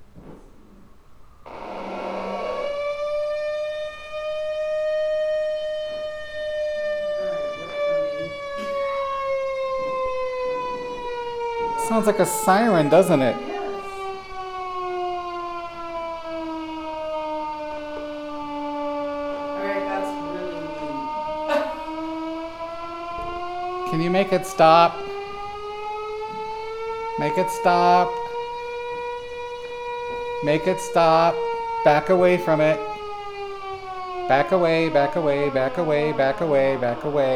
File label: Laser EVP Siren